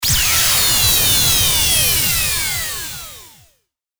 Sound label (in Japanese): エネルギーを発射